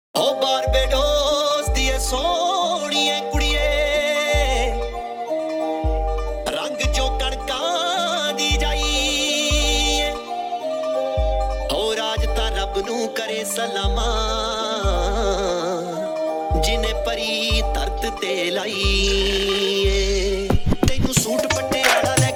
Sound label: Dhol Remix Punjabi Song